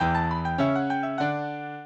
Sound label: minuet, mozart